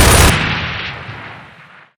troika fire, turrets; gow

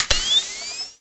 cloak on

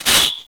injured; spider

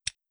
taser x26 click; freeman

